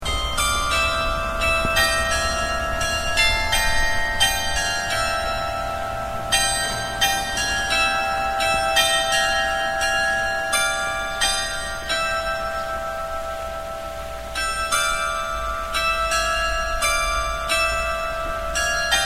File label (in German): altes rathaus glockenspiel